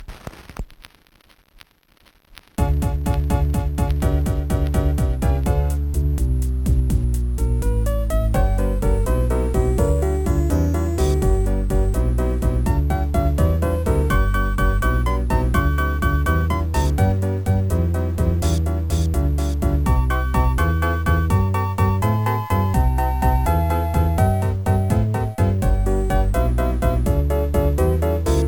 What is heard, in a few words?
techno-blues; peecee